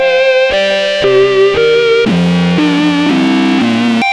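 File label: extra lead (funky); Sonic Foundry (Sony Creative Software); Ugly Remnants VOL-2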